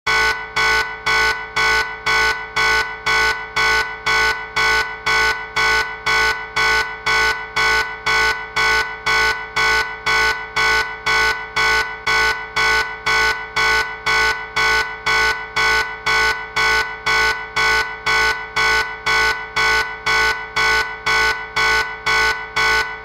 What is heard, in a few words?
Alarm Sound Red Alert Warning